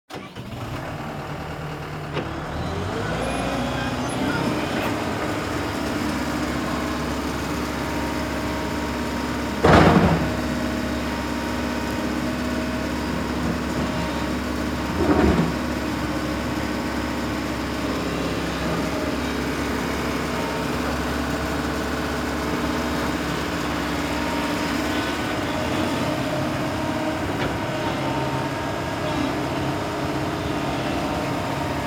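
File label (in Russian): excavator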